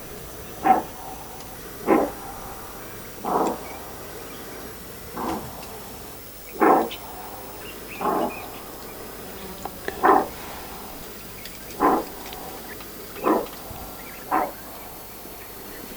Greater Kudu

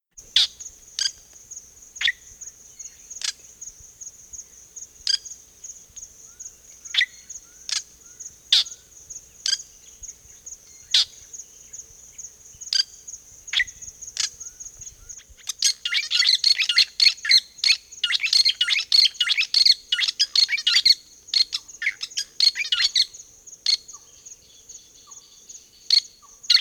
Dicrurus adsimilis, Fork-tailed Drongo

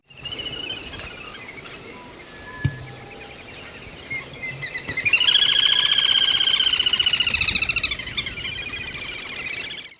Tachybaptus ruficollis, Little Grebe

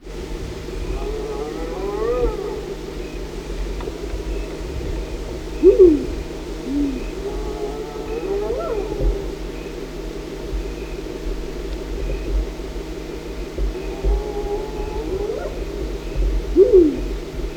Spotted Hyena

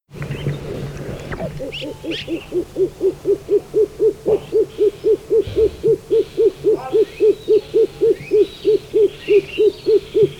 Columba guinea, Speckled Pigeon

